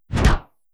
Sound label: martial arts fast punch; mixkit